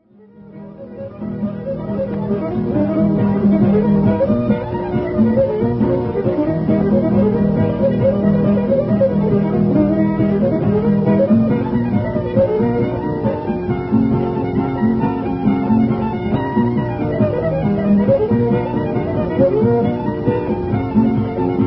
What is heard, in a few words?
Hornpipe